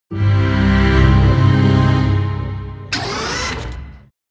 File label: Battle Finished Jingle; Metroid Prime Federation Force soundtrack